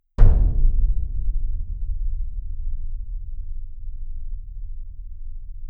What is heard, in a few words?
beat; Jump Game